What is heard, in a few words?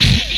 Explosion, retro arcade; Explosions; MMRetroArcadeSoundsPack